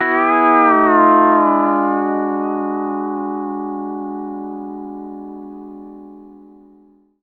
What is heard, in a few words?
E9, PEDSTL CHORD